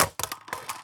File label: phys mag drop ak poly concrete, magazine drops